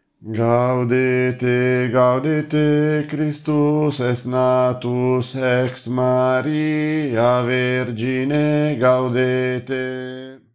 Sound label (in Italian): Gaudete contralti